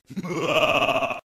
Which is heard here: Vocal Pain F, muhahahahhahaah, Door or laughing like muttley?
muhahahahhahaah